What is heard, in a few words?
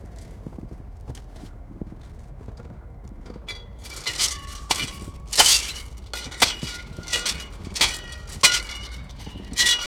pickaxes hitting coal sho; rails